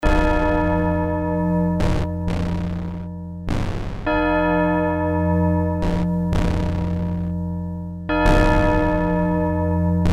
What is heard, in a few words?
electronica starter noise